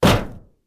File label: Barrel Break